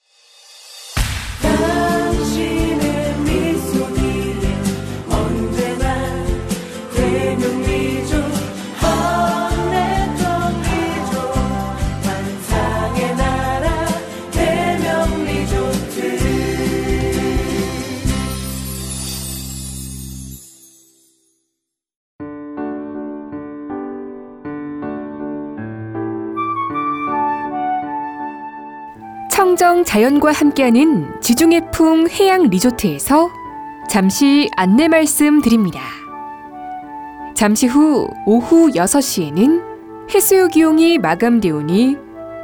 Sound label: bgm; 대명코퍼레이션